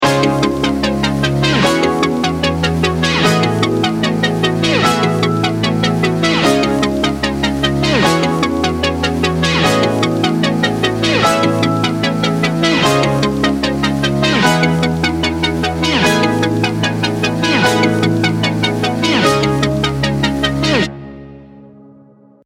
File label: Emotional Beautiful Bounce, piano